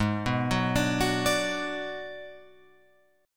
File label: G sharp Minor 7th Flat 5th Guitar Open F, G#m7b5 chord; arpeggiated; JGuitar